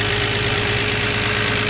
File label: engine